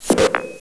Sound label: Sight Pickup Use; Gunlok